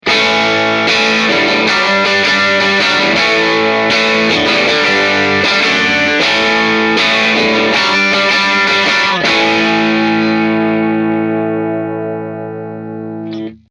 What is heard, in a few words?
Rock; MOLLER